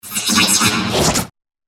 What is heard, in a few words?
phaser